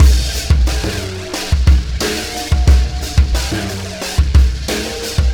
System Overload Beat, Processed Drumkits

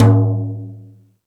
Jazz Drums, E-MU Pro-Cussion; drum machine

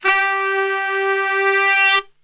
G2 sax

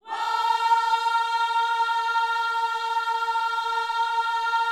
WHOA A#4, Vocal, Gospel; ILIO

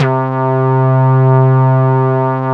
PL MOOG; Wizoo - Powered Nord; STEREO LAYER

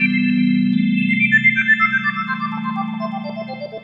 E PIANO 125, Keys; Houseworx

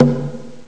wakaiko drum; Amiga Soundtracker Sample Packs

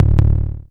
TOM, Dual Percussion Synthesiser; Klone

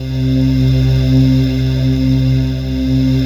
CHOR, Synthesizers

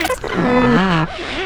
Vox FX

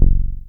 Softy Bass; Best Service Gigapack 2

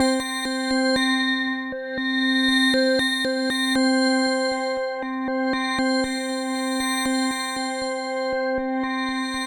FX BELL C3, SYNTH, Trance; AMG; Global Trance Mission VOL-2

